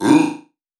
Processed Chant "Ruh"; Cymatics; Euphoria Vocal Sample Pack

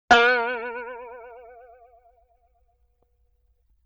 Boing Box High; Vintage Cartoon; Hits & Tones

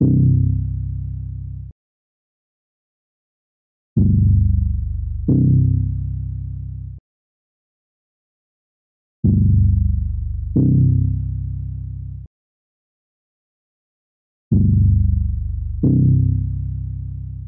91 BPM G Min Bass; Loop Stems; Cymatics; Gravity

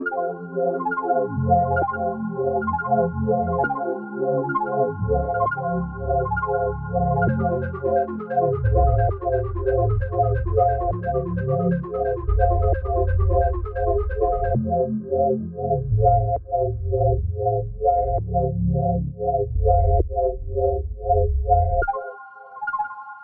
132 BPM F Min, Melody; Cymatics; 2022 Melody Collection Platinum Expansion

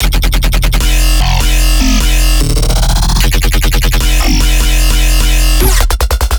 Bass Loop F, Dubstep, 150bpm; Error; Ghosthack; Dubstep Sound Arsenal

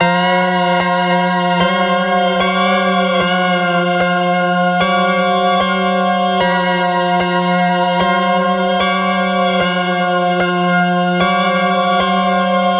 Keys A#m 150, Trap; Loops; GhostHack; HipHop; Eerie

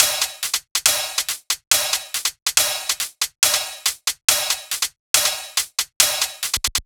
Cymbals 140BPM, dubstep; Loops; Drums; GhostHack; Dubstep Sounds 2025

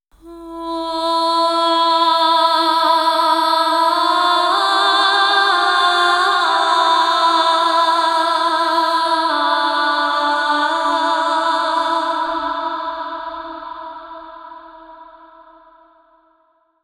Adlib Vocal - Am 65Bpm (WET); Construction Kits; VOCALS; Ghosthack; SkyRealm; Kit 27 - The Crossing Of Jade Sea

